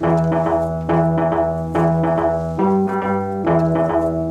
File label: Bass loop; Doctor Who